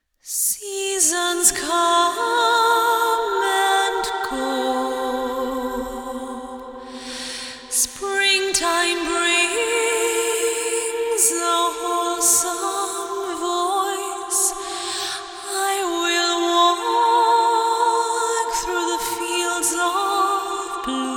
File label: Top Line Vocals (WET); Construction Kits; Cm 65Bpm; Ghosthack; SkyRealm; Kit 02 - Solomon Hill